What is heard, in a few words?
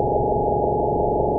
small machine